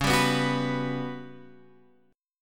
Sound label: G Major 7th Flat 5th